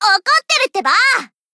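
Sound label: attack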